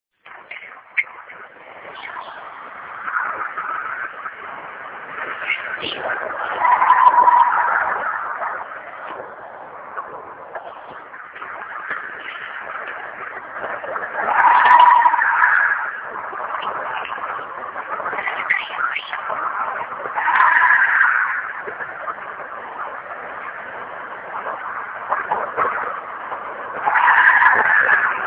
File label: bigfoot howl